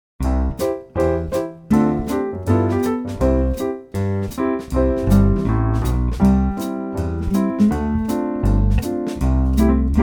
basson

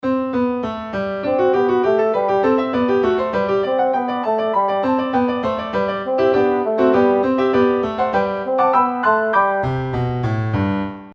ostinato, basson

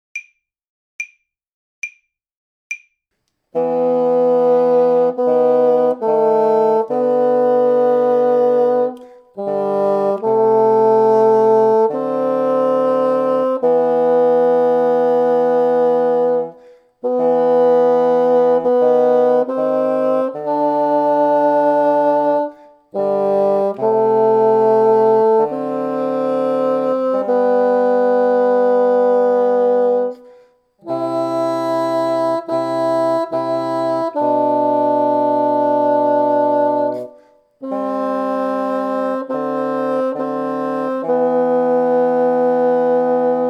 poeme 3 bassons, basson; poeme trio

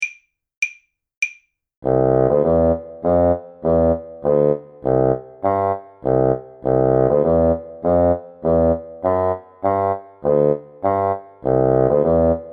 marche soldats trio, basson

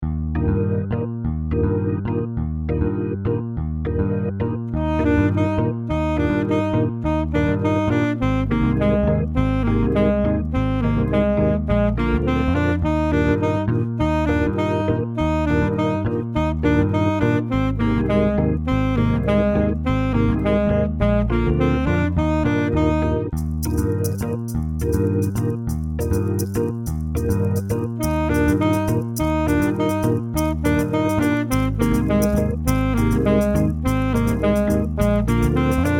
saxophone; mib

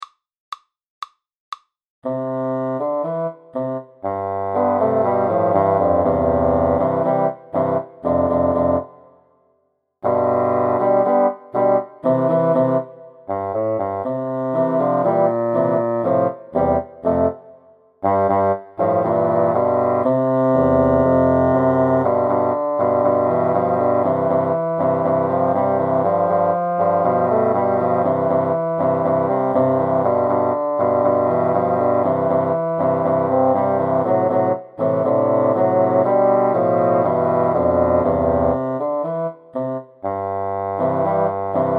petite tango 3 voix, basson

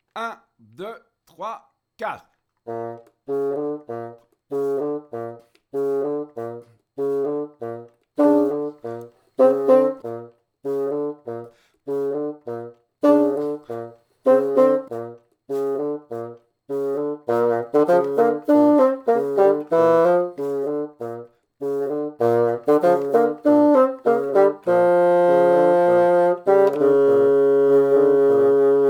baby elephant sans basson

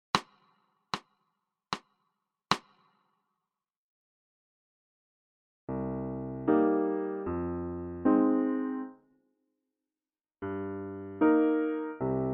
Dorien var 2, saxophone; theme dorien

